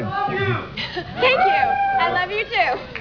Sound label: crowd reply; Replying to comment from the crowd.